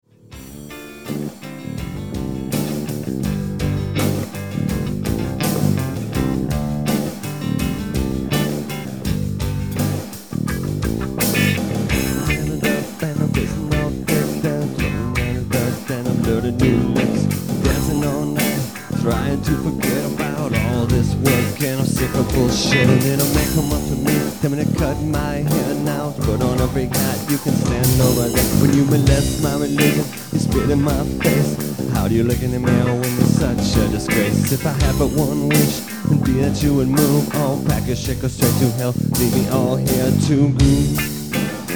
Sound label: groove, Rock, Funk, Reggae, Jazz and Blues; recorded live, in a house, with our P.A. mixer and a blue i-mac